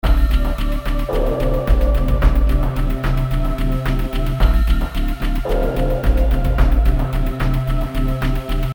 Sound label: Coop Victory; Unkilled